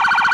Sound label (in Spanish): sirene policia